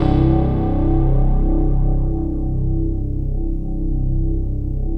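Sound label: keyboard PAD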